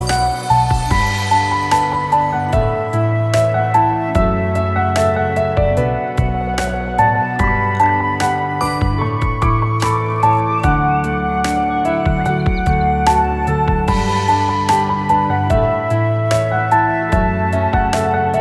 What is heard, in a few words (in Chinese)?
铃声